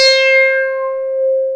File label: ELEK SYNTH; ELECTRC